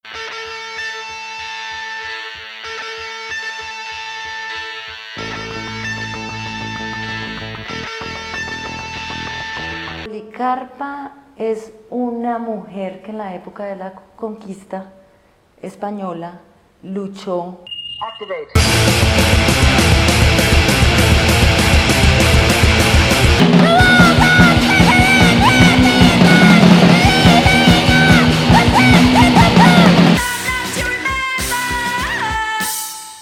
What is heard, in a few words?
Jingle